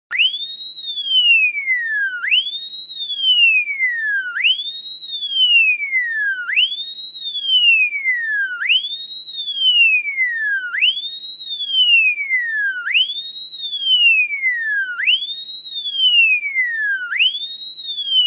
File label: police siren